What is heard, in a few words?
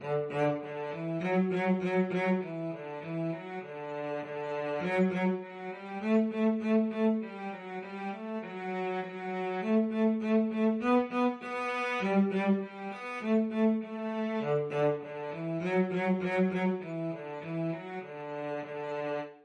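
violoncello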